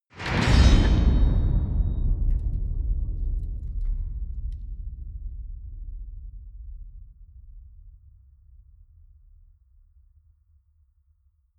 enormous metal gate clang

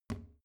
plastic trash can close soft

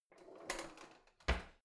old drawer creaky in

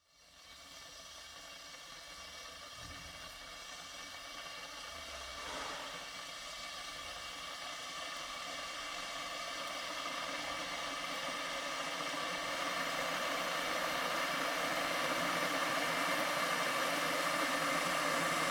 electric kettle full sequence

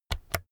keyboard external key press slow

